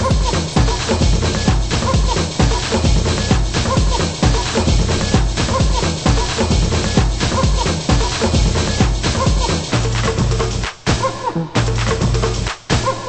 drum

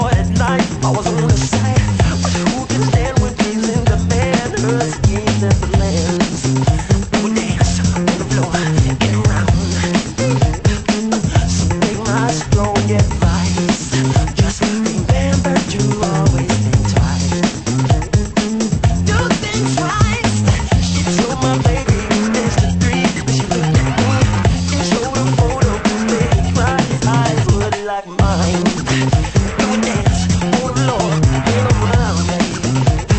funk

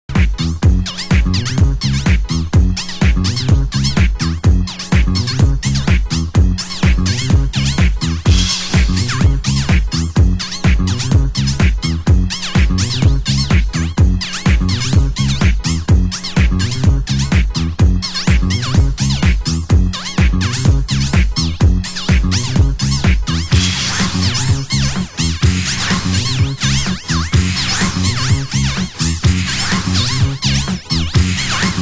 funk

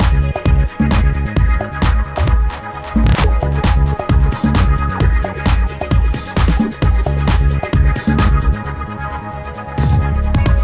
beat

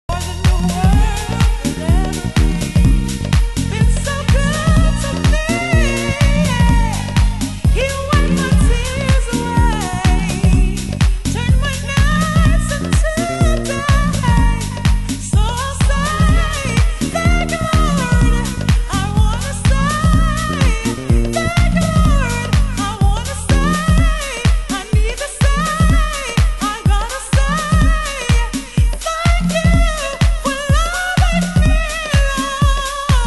jazz

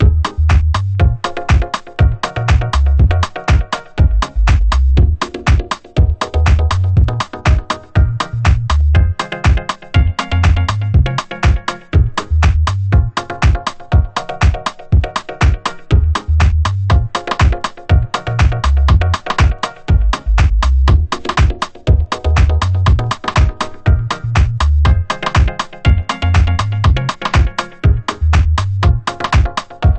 disco

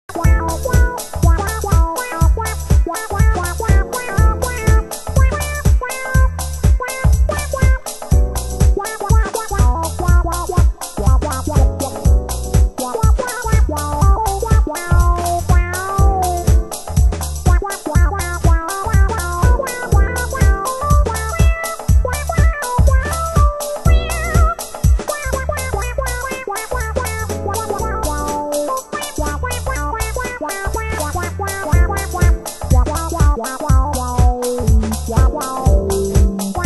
alto

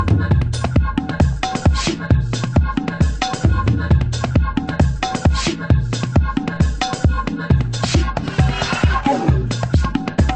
waltz

